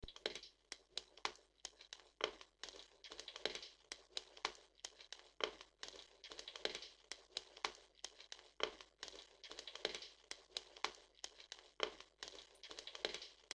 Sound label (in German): Feuer